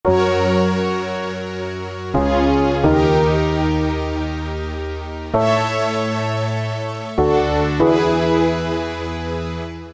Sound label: Strings Elec Piano, Roland PN-D50-03